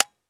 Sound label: akilo47 disconnector; weapons; viper